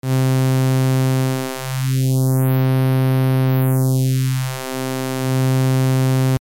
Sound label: notch no reso, synthesizer basics